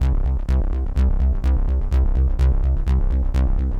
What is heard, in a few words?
bass; recreational research foundation